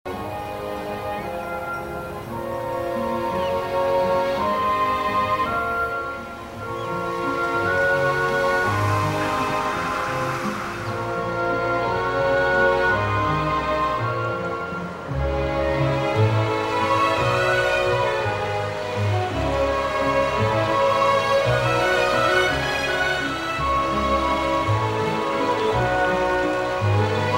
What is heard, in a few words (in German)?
Musik